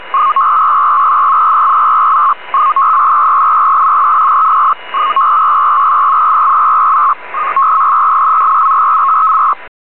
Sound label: Amateur G-TOR